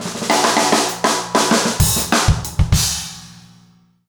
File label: basic funk 100 fill